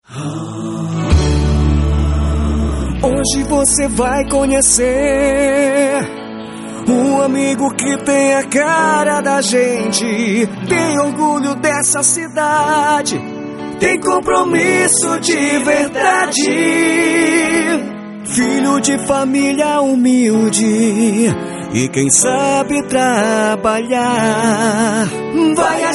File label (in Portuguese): Jingle politico